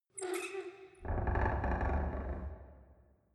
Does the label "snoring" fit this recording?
No